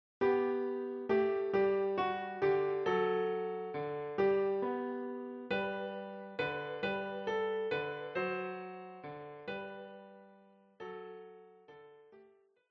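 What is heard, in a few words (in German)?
Klavier; Bunt sind schon die Wälder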